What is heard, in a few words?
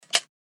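flashlight off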